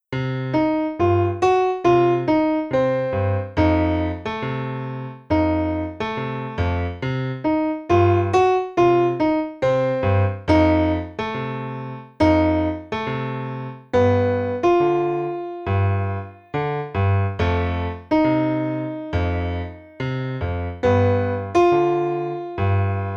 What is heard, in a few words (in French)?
Piano seul